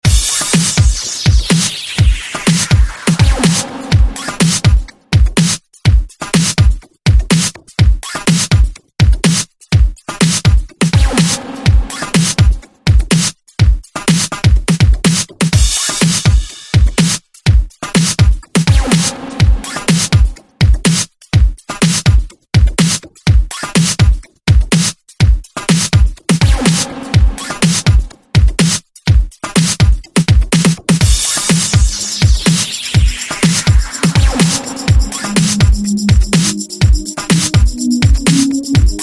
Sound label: Break Beat